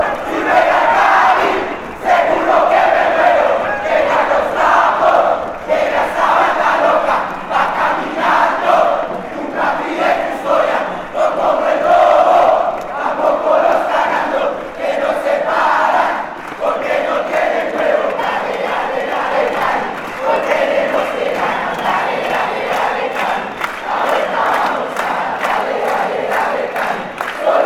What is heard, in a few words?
deportivo cali chant